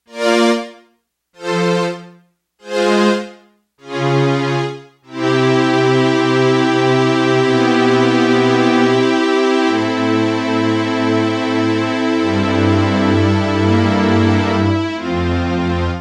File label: Hall Orch, Yamaha TX802; Factory Patches; Voices